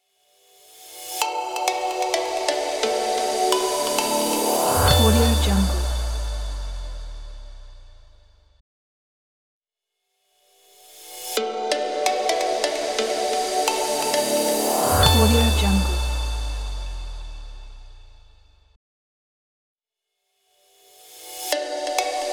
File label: tech logo